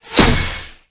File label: Arrow Metal, Damage